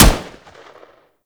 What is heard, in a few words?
M16 Shot; Warframe; Grineer; M16 Rifle Wraith